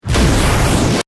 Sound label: Hit Complex Reverse